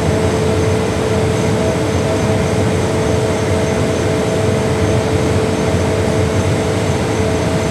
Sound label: vent; Counter Strike Model Pack; CS:GO; nuke